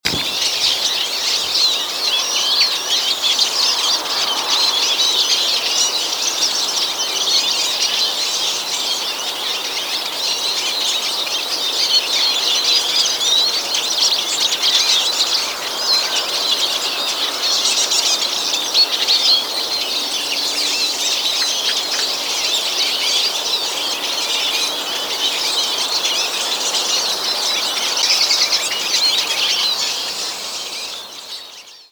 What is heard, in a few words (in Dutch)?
sijs zang